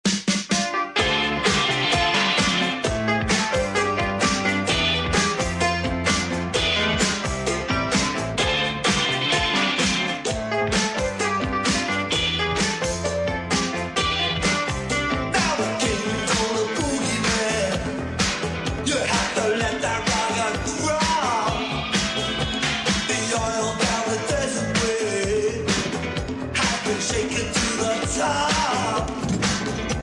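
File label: nokia, ringtone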